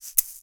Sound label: egg; 96khz 24b mono; Eggs; instrument de musique